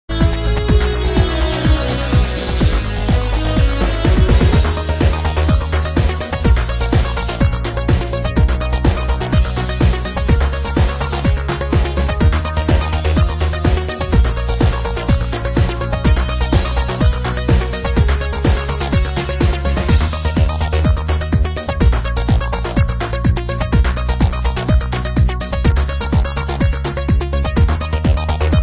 groovy trancey gangsta jazz mix

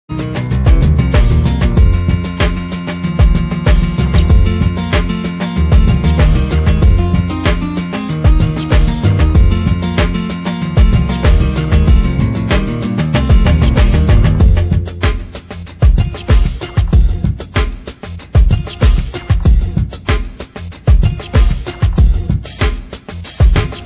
Funky; Boulder Dash

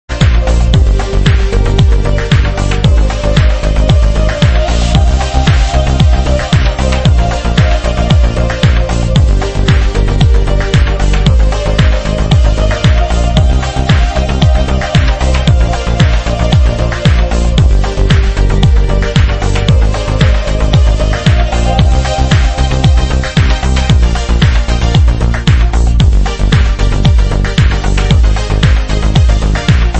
80's Disco Mix; Rambo3

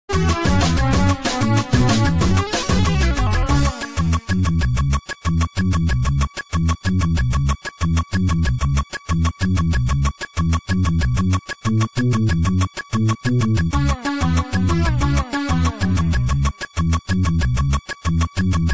BeatMiX

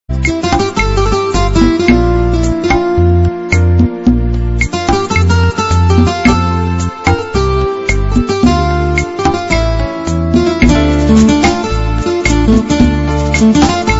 Spanish guitar; Delta

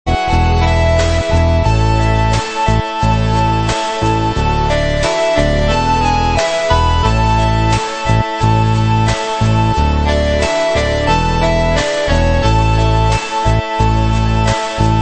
Analog style; Commando

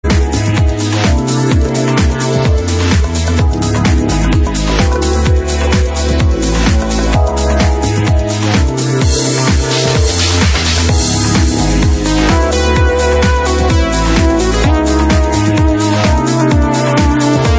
Metal Remix

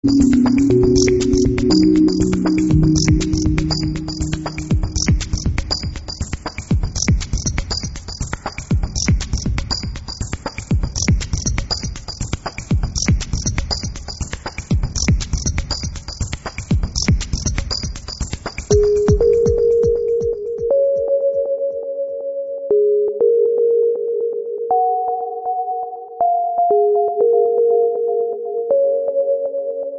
Ambient Remix; Target Renegade

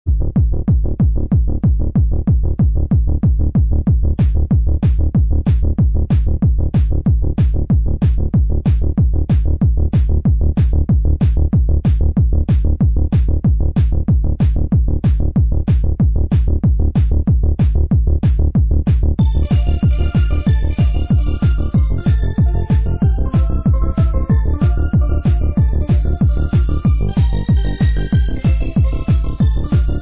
Techno Vibes

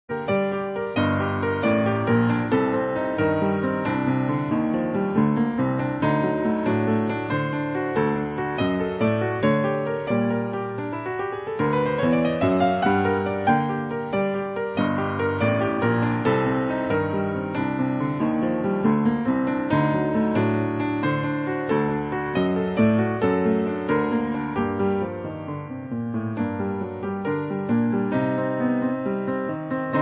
Warhawk (Piano)

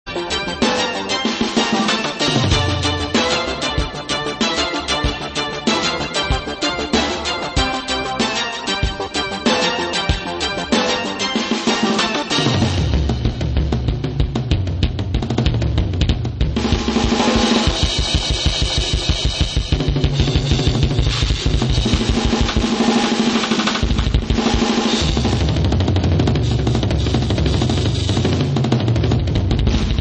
expansive synth

